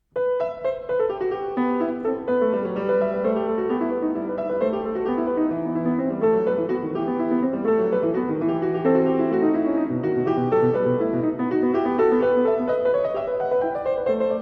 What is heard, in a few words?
sonate e moll